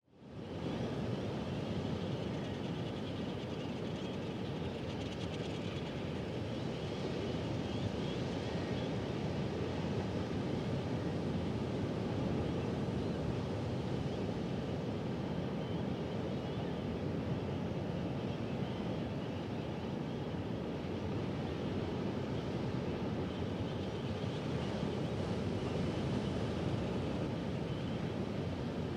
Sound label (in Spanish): MAR GRAVE Y AVES EN PLAYA DE ISLA SAN LORENZO